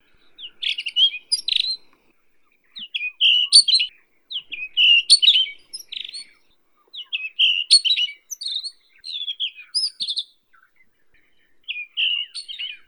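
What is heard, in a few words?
red-bellied redstart, Phoenicurus erythrogastrus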